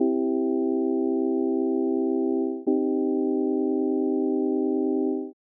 chord major minor

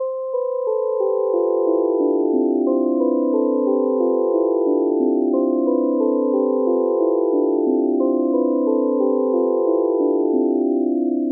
descent hook loop

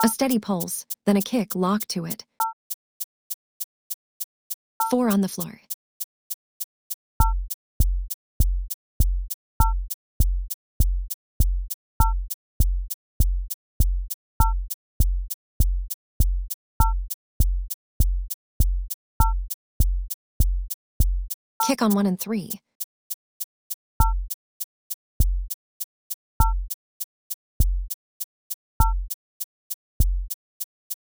rhythm patterns

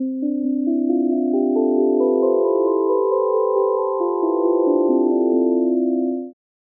melody triplet

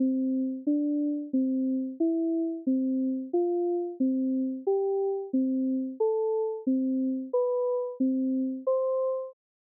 interval ladder

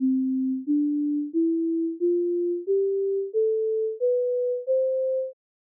synth envelope

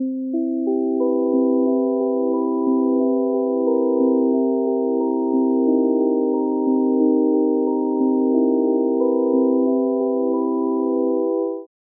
scale over time

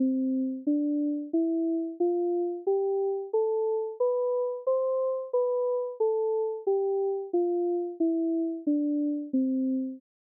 scale major

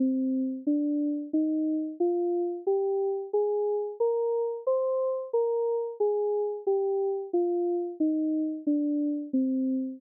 scale minor

